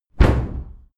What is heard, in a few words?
cupboard close hard